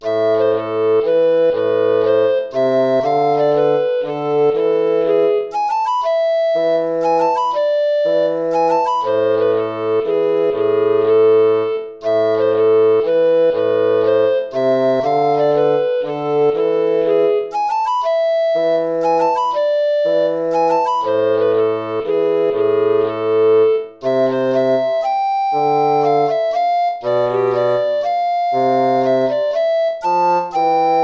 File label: baroque minuet minor